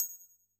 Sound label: Perc, drum